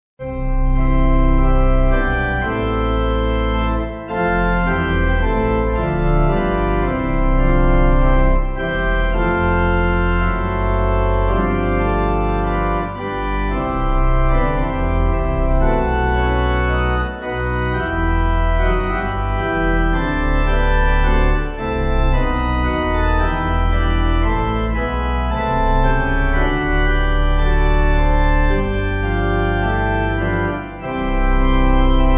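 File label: Pipe organ, church music backing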